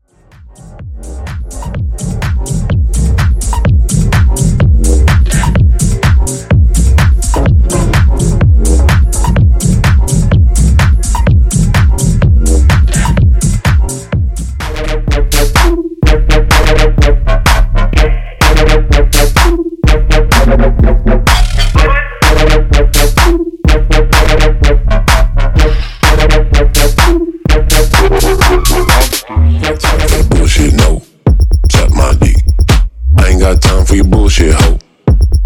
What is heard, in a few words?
Bass House Megamix 126Bpm; CleanDirty